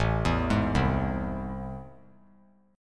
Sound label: A Minor Major 7th, down; arpeggiated; AmM7; JGuitar